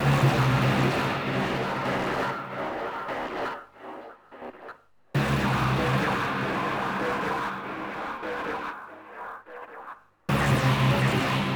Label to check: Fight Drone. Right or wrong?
Right